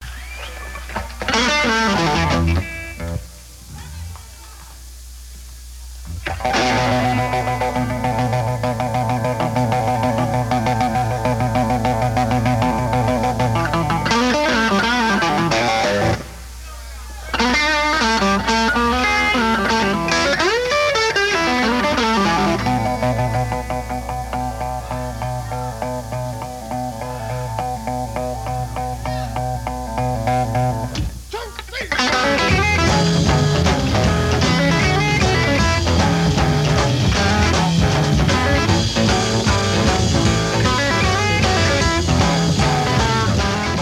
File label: Instrumental II